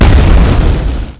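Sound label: rocket explosion, weapons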